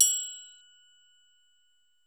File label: TRIANGLE, PERCUSSION; Crypton - DrumBase5000